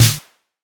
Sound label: crisp steel snare drum sound a sharp key